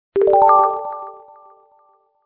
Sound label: new chat